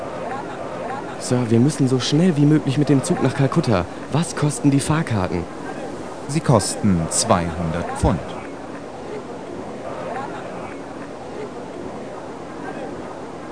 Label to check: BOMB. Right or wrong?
Right